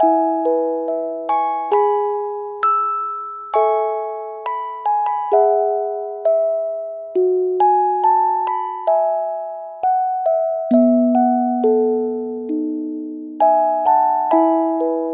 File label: keys music box, sad loops